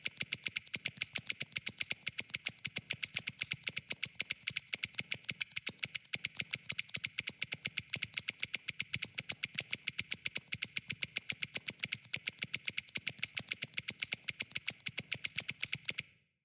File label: typing